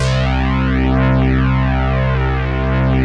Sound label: POLY SYNTH; Wizoo - Powered Wave